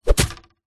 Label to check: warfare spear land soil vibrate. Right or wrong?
Right